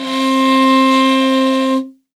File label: R, morin khuur, World Instruments; Best Service ProSamples vol.52 - World Instruments 2; AKAI